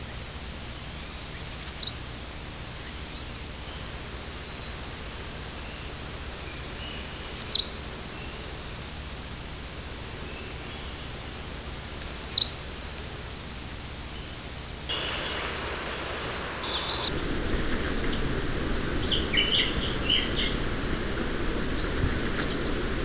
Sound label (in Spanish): candelita